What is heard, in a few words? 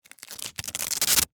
Masking Tape Peel, Industry, Masking, Tape, Peel, Off; Offices